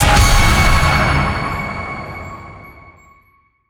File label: medium win